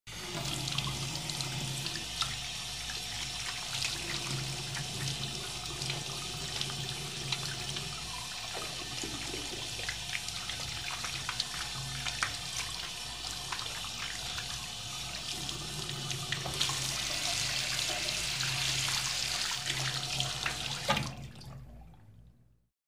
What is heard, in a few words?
Water flows into a metal sink